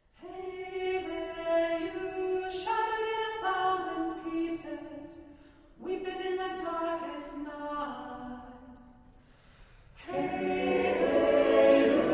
Arr. For Vocal Ensemble

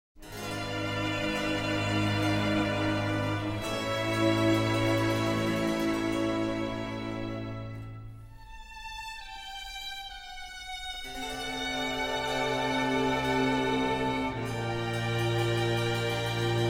Concerto For Strings And Continuo In D Minor

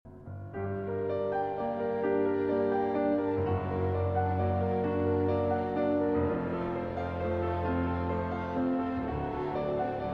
Piano Concerto No. 3; Glass; Movement II

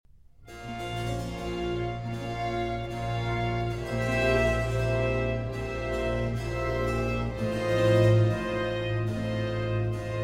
Concerto for Violin and Strings in D

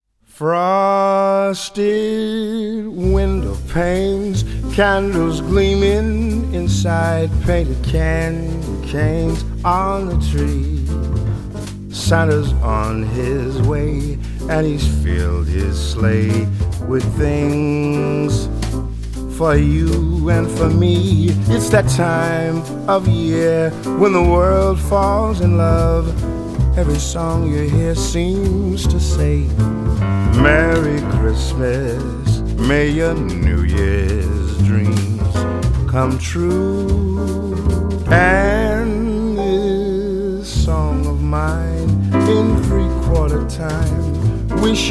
Christmas Waltz

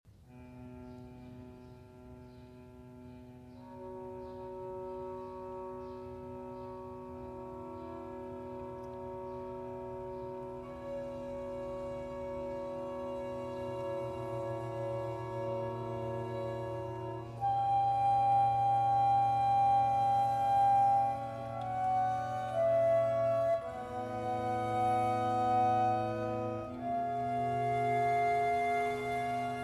Flute Concerto in G Minor

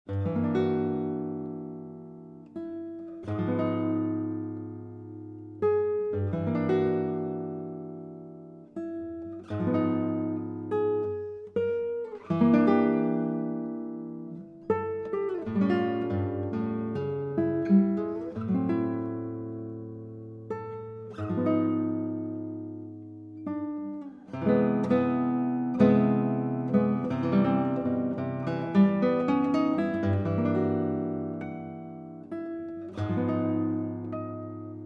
Guitar Solo Prelúdio; Two Pieces For Guitar Solo

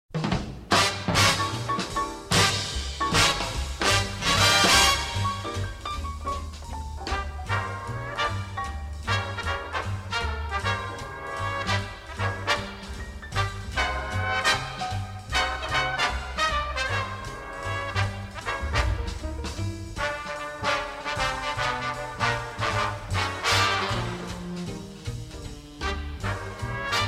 Orchestra

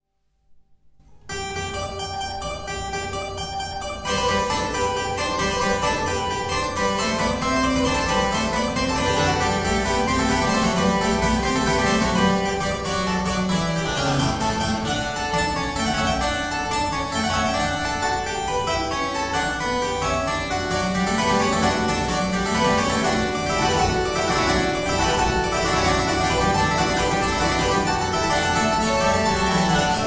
Keyboard Sonata in A Major (Allegro)

